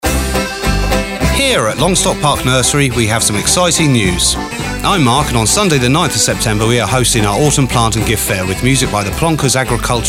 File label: instrumental; breeze fm longstock park nursery